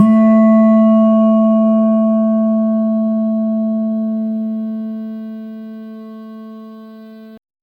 a clean dry audio recordi, single note

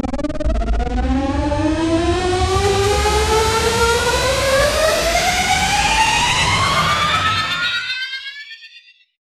a wild chaotic spell char

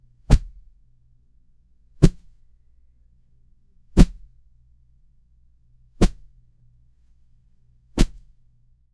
cloth thud soft impact

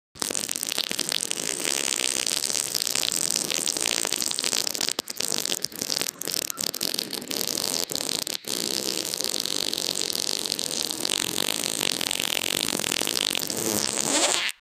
moist fart strong sound; sound effect; body sounds

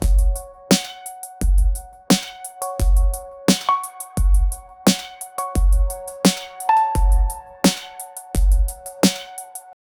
deep lo fi beat with stea; field recording

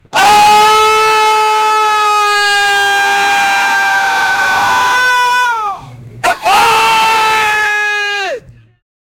man screaming while chasi; sound effect

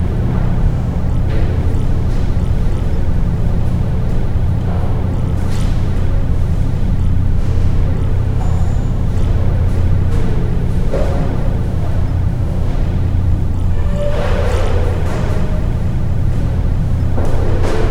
ambience inside a hallway, airship

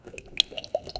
drinking sound; minecraft

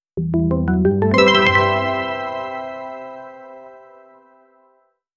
a rising motivational ins

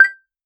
ui sound for an education; feedback

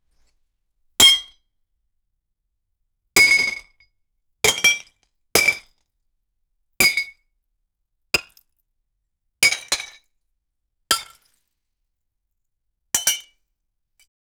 shattered mug sound

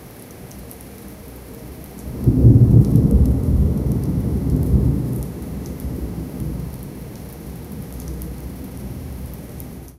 heavy rain sounds with; atmosphere

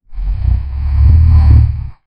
monster roar bite

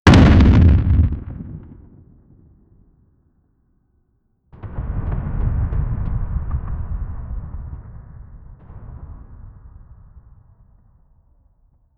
realistic effects for a, artillery

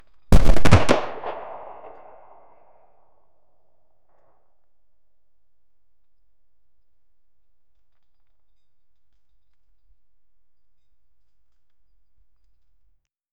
a series of gunshots foll

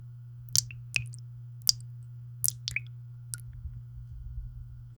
subtle close mic sound of

